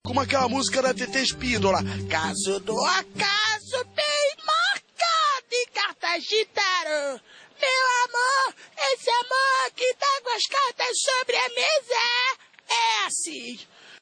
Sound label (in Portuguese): cantando